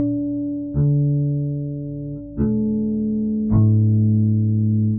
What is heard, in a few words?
tuning, bass Gt; phrases